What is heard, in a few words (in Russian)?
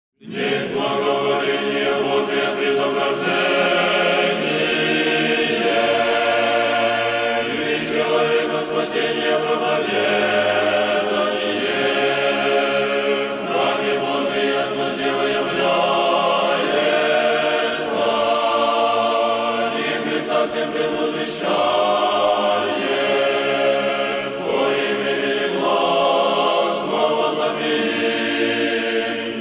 Мужской хор, Тропарь; Минской Духовной Семинарии; Введению во храм; обиход